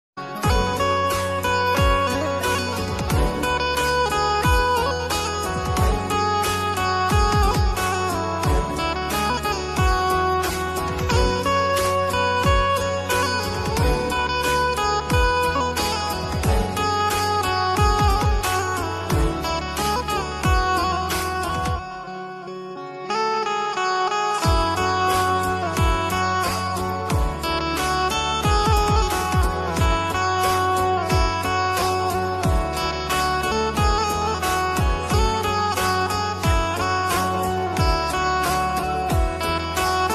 ringtone flute ringtone instrumental ringtone shiv mahadeva ringtone